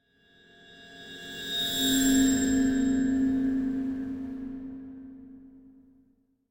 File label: Cymbal Bowed Metallic Tone High Scary Element; Hollywood Edge; sound designer's toolkit v2